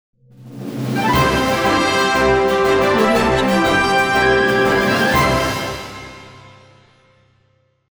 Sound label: march logo